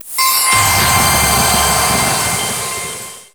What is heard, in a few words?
SE RESULT SSS; maimai